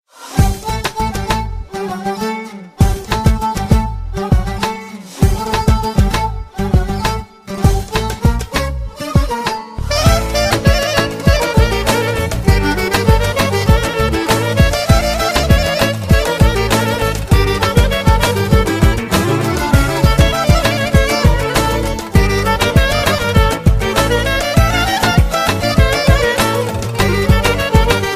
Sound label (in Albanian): Valle Shqip